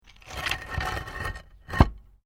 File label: PAVING STONE MOVE, dragging